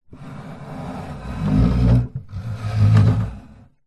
household coffee table wood maple heavy legs slide wood floor slow